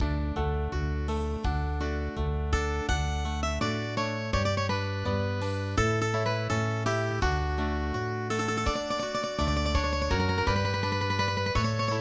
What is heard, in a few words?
Mandolin Club